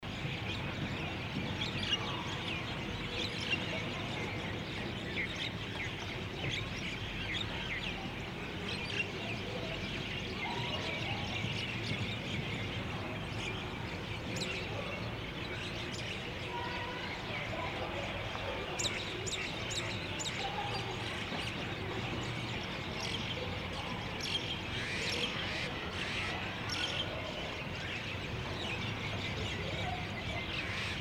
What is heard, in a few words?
Urban Spring Atmosphere with Birds